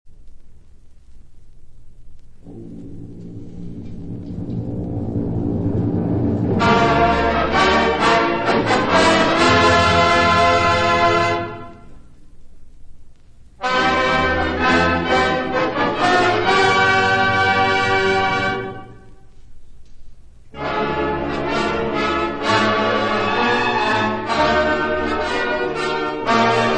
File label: Fanfare